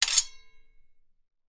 swords x 2 hit scrape

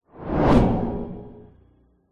science fiction land vehicle pass by zoom fast; zapsplat

